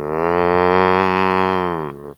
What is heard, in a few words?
cow idle; svencoop; cow tycoon; gonome replace